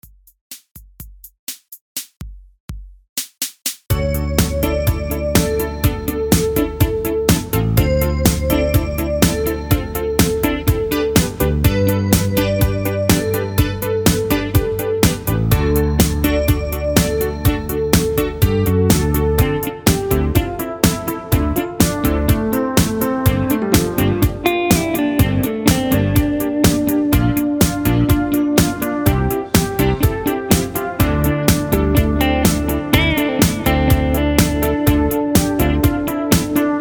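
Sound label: Instrumental with Leads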